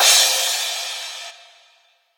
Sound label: crash